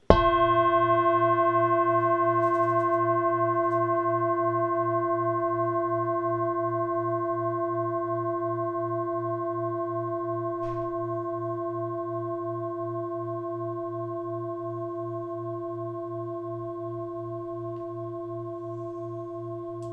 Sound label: Tree of life 26 cm, singing bowl; klang skal